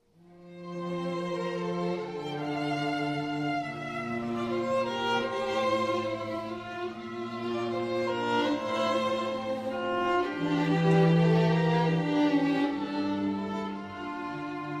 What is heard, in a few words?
strings trio